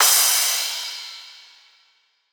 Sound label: Crash; Riddim; One-Shots; Hats and Cymbals; Ghosthack; Riddim Essentials Volume 2